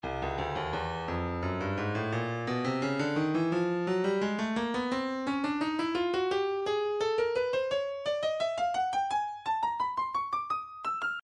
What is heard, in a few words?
breakbeat 086 bpm; man d; Stylus Breakbeat Midi Files